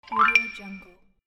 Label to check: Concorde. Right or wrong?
Wrong